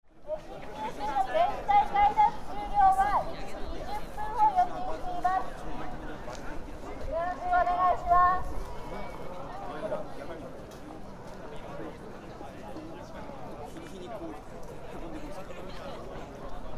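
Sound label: outside entrance ceremony, Fukushima University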